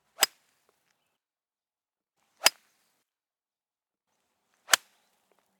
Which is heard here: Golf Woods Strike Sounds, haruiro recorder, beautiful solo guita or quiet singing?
Golf Woods Strike Sounds